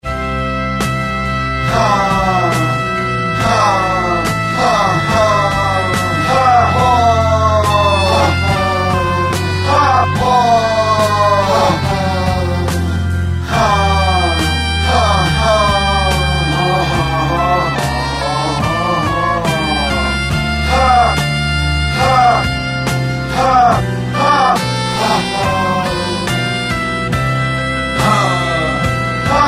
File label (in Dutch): ritmisch lachen